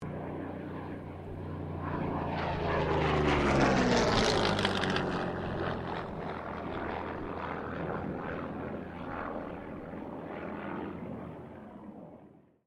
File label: AIRCRAFT YAKOLEV YAK-9 PASS STEREO, Russian Yak-9 fighter plane; Airplanes